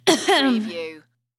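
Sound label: Female Cough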